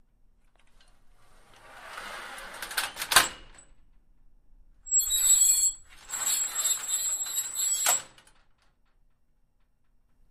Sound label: Door Accordion Sliding Metal; Single; Open And Close 3x, Very Squeaky, Slow, Medium And Fast; Metal Gate